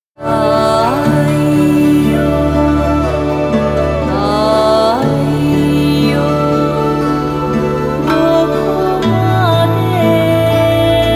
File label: chant